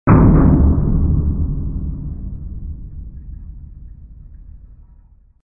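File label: door sounds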